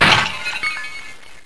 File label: bust glass, debris